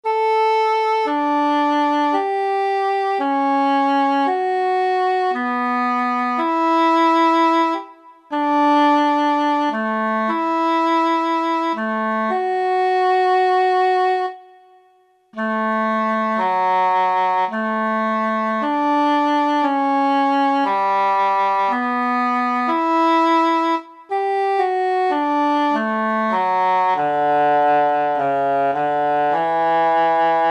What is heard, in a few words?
Eng Horn; E-mu Proteus FX